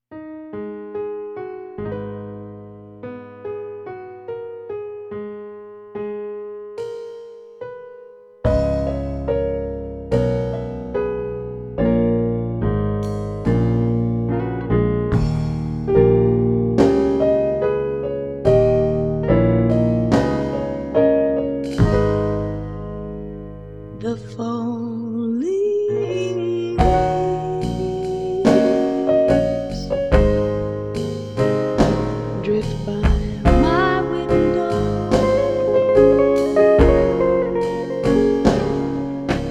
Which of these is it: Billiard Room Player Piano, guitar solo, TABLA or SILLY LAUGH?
guitar solo